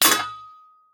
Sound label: Sword Hit